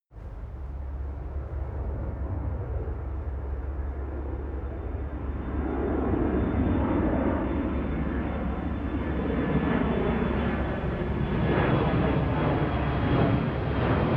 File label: plane flyby land